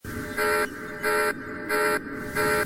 System Failure Alert

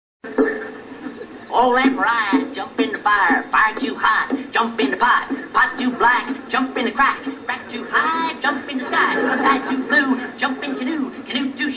Ernest sings

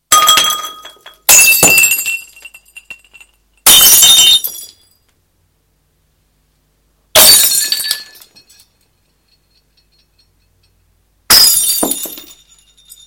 Glass Broken

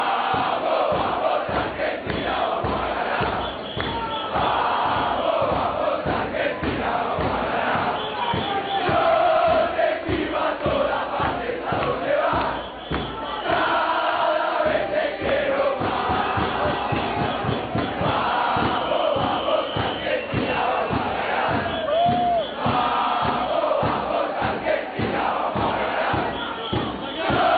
Argentina Chant

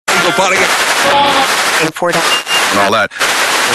Radio Tuning

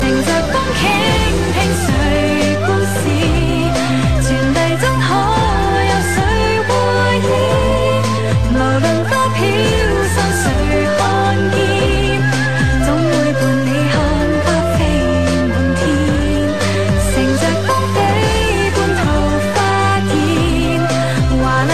Anime Song

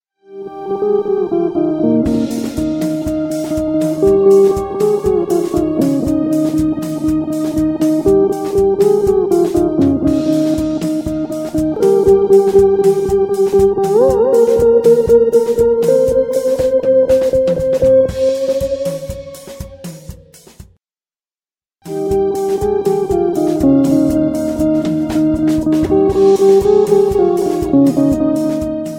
Guitar Composition

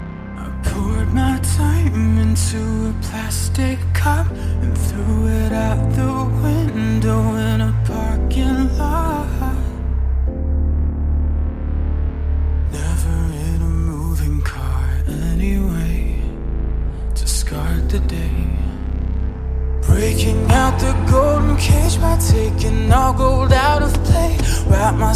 Resistance electro

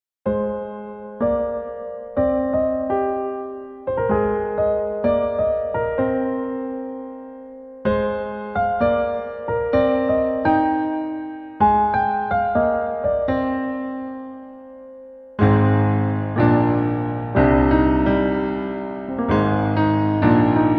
Ocarina

